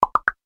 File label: Pops Up